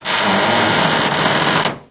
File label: wood creak; hl2